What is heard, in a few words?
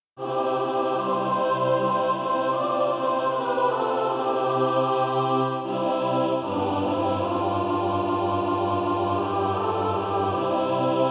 mixed; Christ Is Coming; Bilhorn